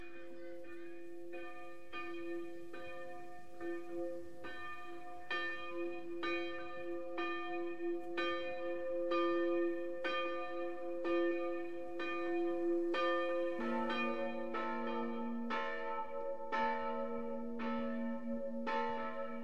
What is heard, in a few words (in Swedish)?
klockor; glava